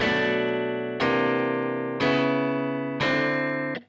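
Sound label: guitar chords, Hybrid neural guitar overdrive; Morphdrive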